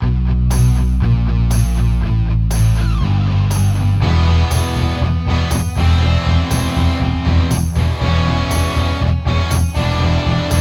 Drummin Backing; EyeToy Play 2

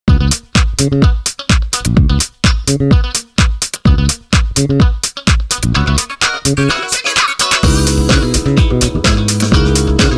Funk Boogie; DanceDanceRevolution X; arcade; PS2

